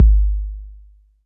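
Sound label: bass drum 808 Sat Mid Decay C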